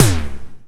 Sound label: PERC FX, SYNTH PER; PERCUSSION